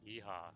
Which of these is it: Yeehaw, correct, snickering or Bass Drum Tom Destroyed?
Yeehaw